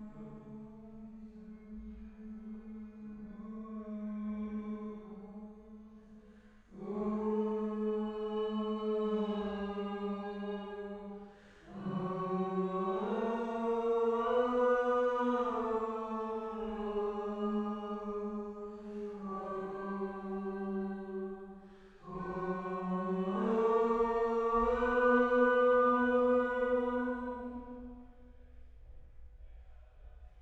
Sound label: Choir